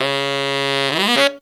TENOR FX, Horns; Zero-G; Phantom Horns

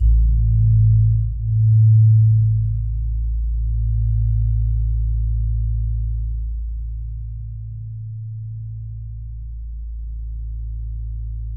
LOW A#2; Q Up Arts; Denny Jaeger Private Collection

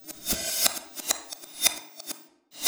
Cymbol Particle; Particles

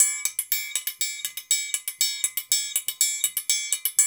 Hip-Hop Gong; Spectrasonics - Supreme Beats - World Dance

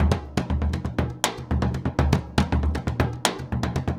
SURDO, MERENGUE; Best Service ProSamples vol.14; AKAI

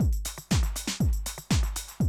120, Classic Drum Loops

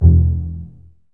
BSS E 1 Pizz, String Ensembles Basses; Miroslav Vitous

